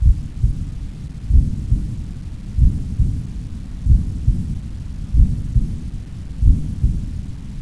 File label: vision